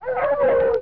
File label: babies crit stop; Desktop Themes 2000; Tiere